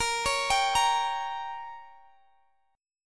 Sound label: Bb Minor 6th, Guitar, Open D